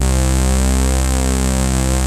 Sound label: PRO 5 SYNS; Pro-Rec - Synthsonic